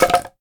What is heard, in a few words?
Log Stn Hit